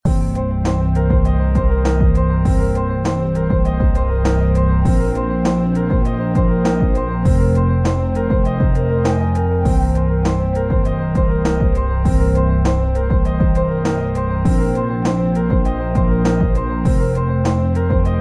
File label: Ambient Loop